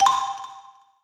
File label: PUZZLE Success Xylophone Two Note Climb Bright Wet; stereo; Universal Sound FX